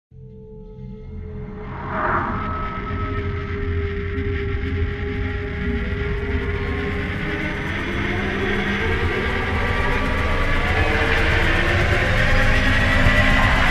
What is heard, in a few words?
Dark Ascension, risers; Gothic Storm Music